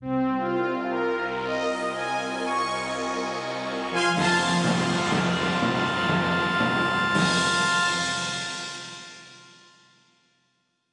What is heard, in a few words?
Science Fiction